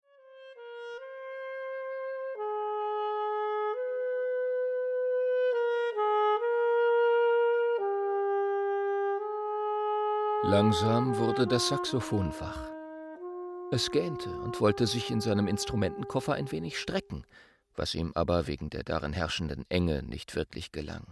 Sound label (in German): Das mutige Saxophon